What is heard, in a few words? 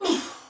Pain, Soldier Female, Battle; Dialog; Barks; Sinecure